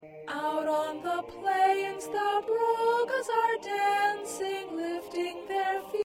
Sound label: Soprano; Carol Of The Birds; holiday songs